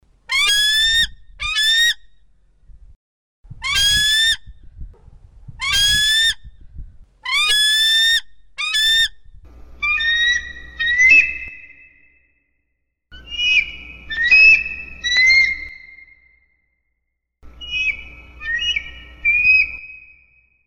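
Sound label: bald eagle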